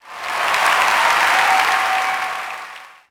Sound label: Cheer, Crowd, reactions